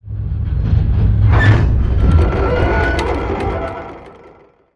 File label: Wind Mill Stop; moul